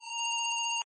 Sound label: Lower ringing